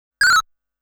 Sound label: Coin Credit; Namco Classic Collection Vol.2; arcade